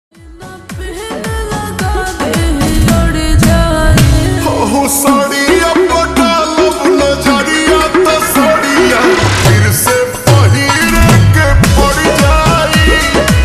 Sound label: Slowed Reverb Ringtone